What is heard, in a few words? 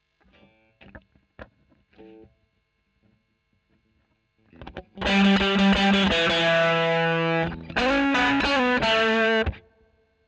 E. GTR Royer OD